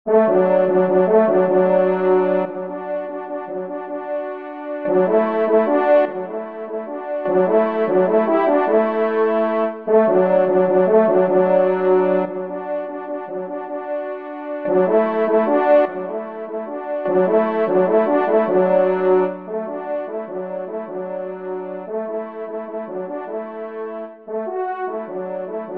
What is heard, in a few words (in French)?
Marche des Chasseurs d Alsace, Do Maj, trompe; F. Schann